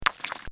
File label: Cartoon Sound Effects Plop; Cartoon Sound Design; Bobby Cole